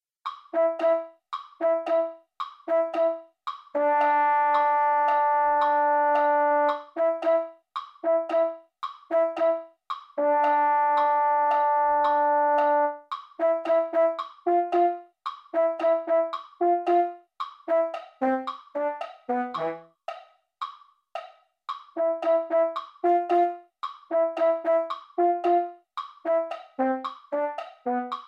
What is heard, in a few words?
mellophone; holiday brass